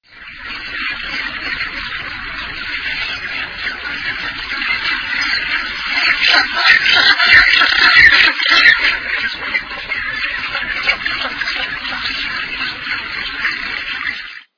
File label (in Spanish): pinguino frente dorada